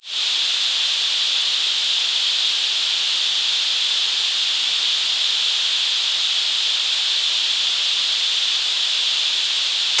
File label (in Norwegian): Baandbegrenset stoy; oresuslyder